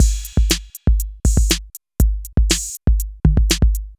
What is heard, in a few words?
Synth Loop, BPM120